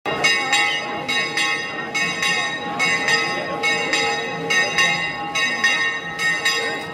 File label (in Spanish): campanas santuario; de tiscar